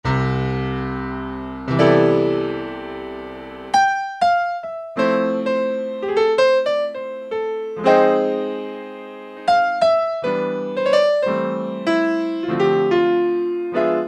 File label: Ac. Piano, Roland U-110; Factory Patch